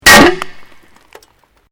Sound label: Glass pane impact no break; Material components for constructing more complex sounds